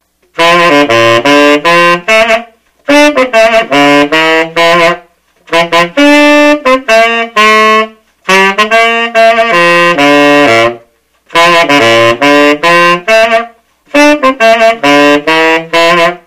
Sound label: Buescher Killer Bee Big B Tenor